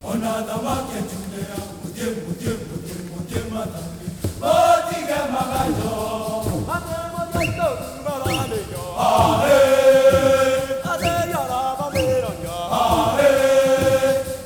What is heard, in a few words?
Heart of Africa; Spectrasonics; Heart of Africa 2